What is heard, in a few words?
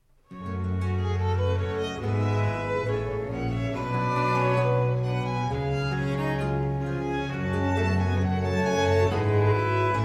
instrumental sonata in g minor; Instrumental Sonata a5 in G minor; cz-kra iv 63; ACRONYM